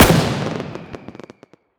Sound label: Balloon Pop; SteamVR; InteractionSystem; Longbow